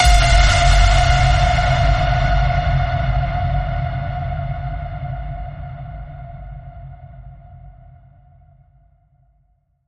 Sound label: The Final Ping, F